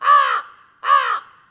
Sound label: crow